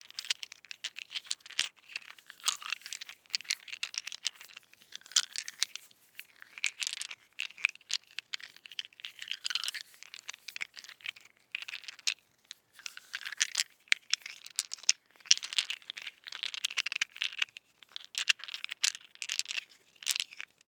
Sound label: DICE in Hands, Manipulate, 6 Six Dice; Samples; ArticulatedSounds; DICE Sfx Party (Extended)